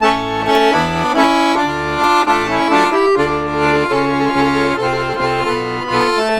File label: ACC, Balkanish Traditions